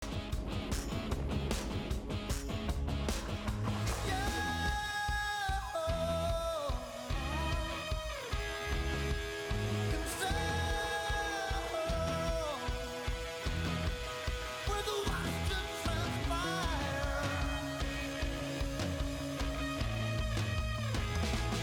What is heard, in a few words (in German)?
Surfermusik